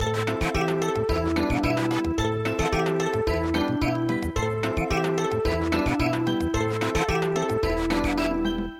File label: Samba, midi